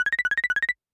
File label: bigwin countup end; riviera Star; Fantasma